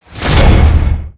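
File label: Knock Wide Swing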